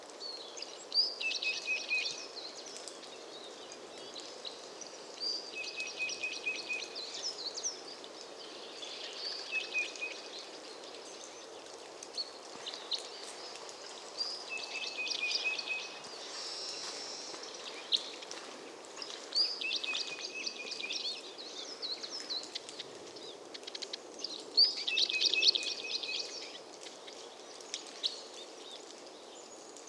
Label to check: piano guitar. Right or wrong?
Wrong